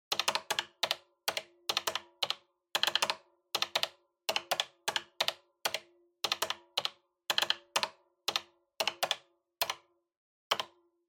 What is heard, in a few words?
Calculator (Pattern)